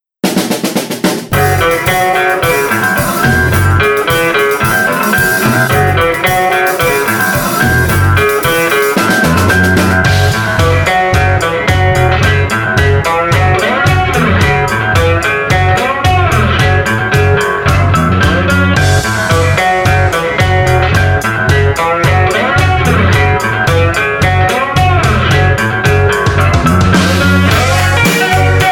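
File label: Street Guitar Dobro Drums, 110 bpm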